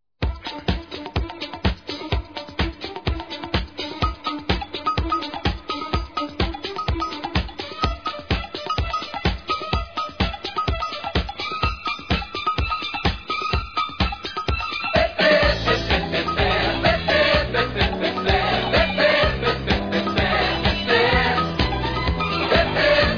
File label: DISCO SAMBA